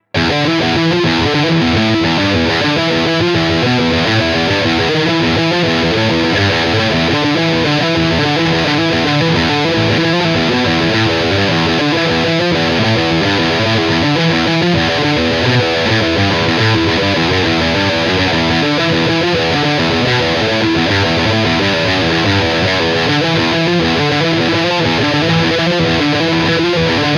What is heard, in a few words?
High Gain